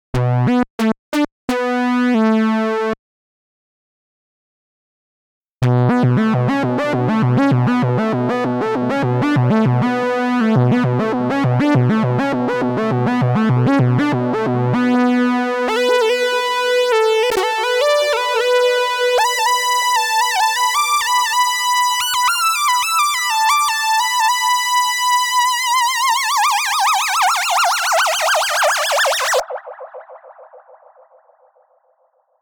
Vintage Oil Can Echo, The Short head is used to create warm slapback for a lead synth.; Dry and Wet mixed in Pro Tools; Oberheimlich / Maneuver; Olivera